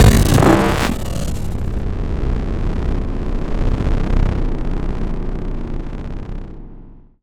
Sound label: cinematic texture; trailer; Bluezone Corporation